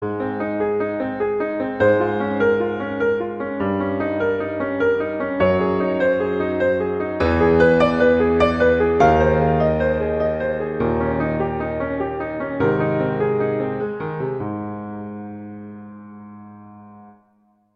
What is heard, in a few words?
Prelude - Ab major; Clementi; Preludes